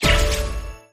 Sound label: Appear Scatter Sound